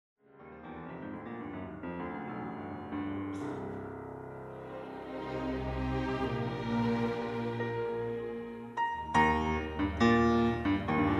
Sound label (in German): Conzert Piano